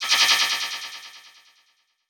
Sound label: Transition Laser Warble; Production Element